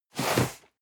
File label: cardboard box medium slide hard; objekte